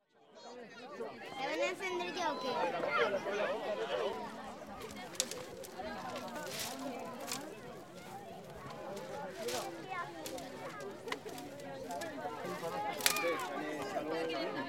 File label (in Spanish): Falleta Corte Ambiente; San Juan Plan